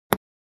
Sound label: Ping pong ball bouncing